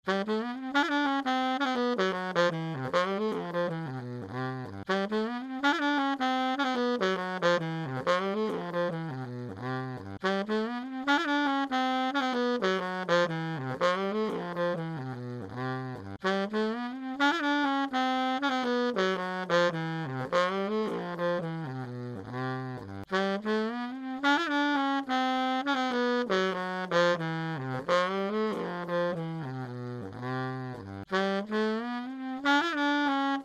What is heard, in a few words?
100 50bpm